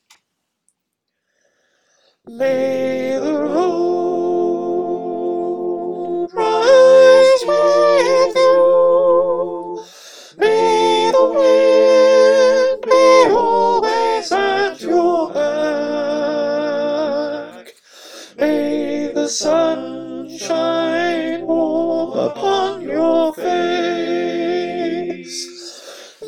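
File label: soprano, choir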